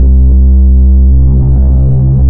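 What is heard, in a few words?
sweep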